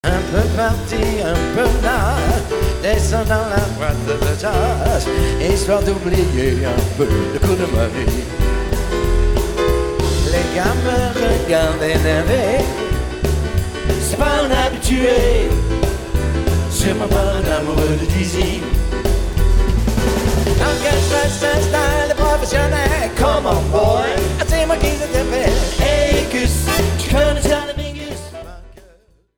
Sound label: Jazz; Boite de Jazz